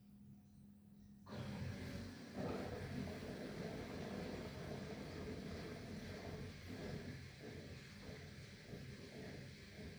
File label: drainage; minha lavadora samsung esta fazendo barulho; home-appliances